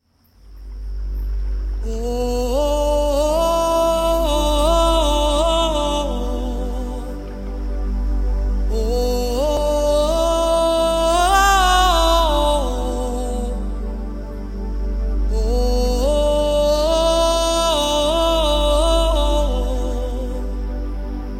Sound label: Chant